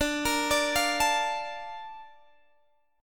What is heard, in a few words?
A sharp Major 7th